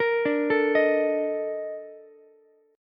A sharp Major 11th Ukulele, chord; A#M11; arpeggiated; JGuitar

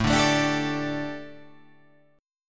A 7th Suspended 4th, chord

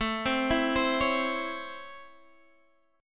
Db Minor Major 7th Sharp 5th A

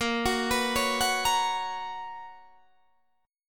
B Major 7th Suspended 2nd, chord

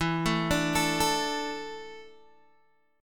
E 7th Suspended 4th, Guitar, Open G

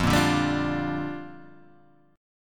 F 6th 9th Suspended 4th F, chord; F694 strummed

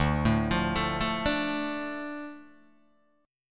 D Minor, Guitar, Open D

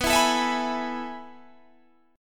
A Major 7th Suspended 2nd B

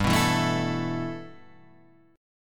G 6th Add 9th, Guitar, Half Step Down

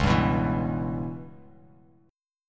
Db Minor 7th Flat 5th Db down Bass Standard; strummed; JGuitar

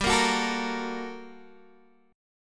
G Minor Major 9th G, Guitar, Open D; chordshape; down

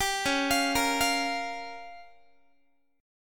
Db 6th Flat 5th Db down Guitar; Db6b5; arpeggiated; 22,21,20,20,21,x

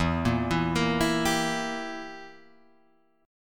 E Diminished down Guitar Open G

